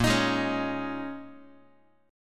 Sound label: Bb Minor 11th, Guitar; strummed; JGuitar